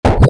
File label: object bubble pop; starbites